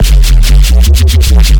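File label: bass Filler D minor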